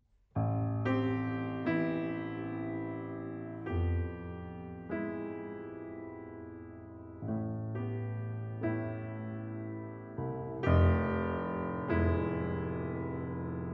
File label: pianobello